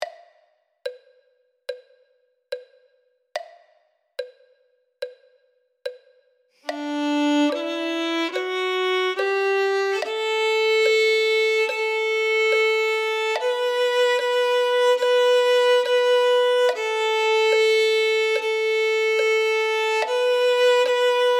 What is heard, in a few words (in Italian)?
violino I